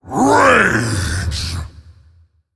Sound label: Male Faceless Attack